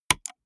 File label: gaming mouse left click single hard; maschinen; buero